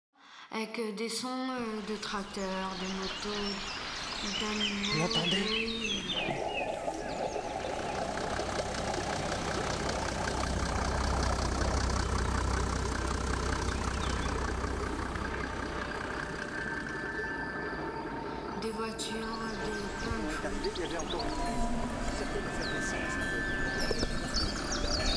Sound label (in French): katajjaq echos